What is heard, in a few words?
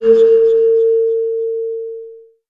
ghetto rock, Synths